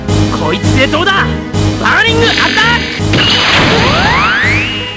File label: burning attack; GT Trunks